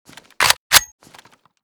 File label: ace21 unjam, weapons; Mask's Reanimated Anomaly Arsenal